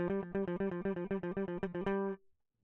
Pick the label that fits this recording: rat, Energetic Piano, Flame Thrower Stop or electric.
electric